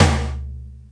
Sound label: drum; proteus1